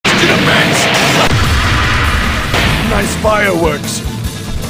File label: Nice Fireworks